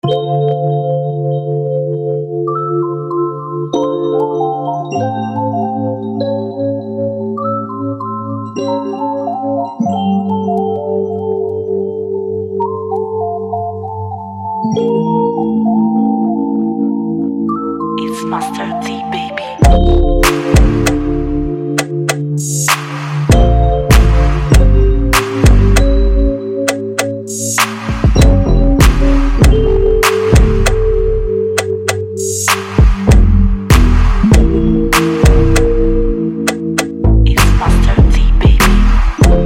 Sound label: Beat